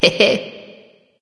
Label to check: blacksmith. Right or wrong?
Wrong